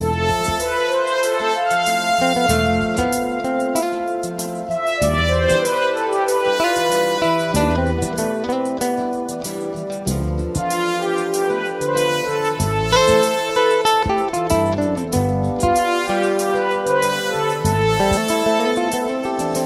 Love Tone

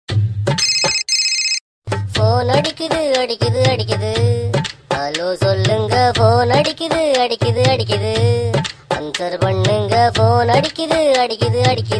Phone Ringing Tamil

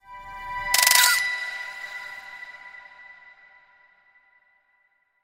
Photo Burst

